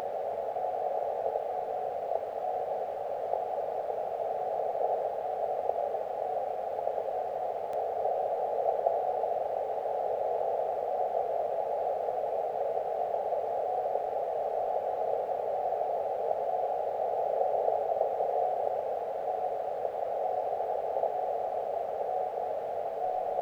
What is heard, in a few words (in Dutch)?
RRXP zwak signaal zonder en met ruis